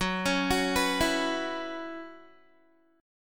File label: Gb 7th Suspended 4th Gb down Guitar, chord